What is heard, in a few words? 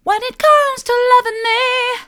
when it comes to loving me soft, vocal